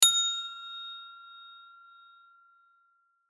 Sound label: alert